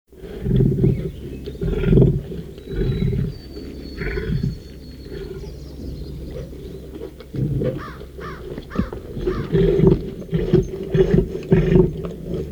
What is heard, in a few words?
Tiger Cub; Halloween Sound Effects